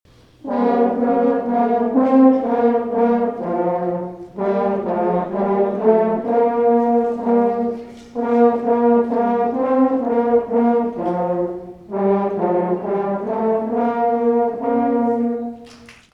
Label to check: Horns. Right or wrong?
Right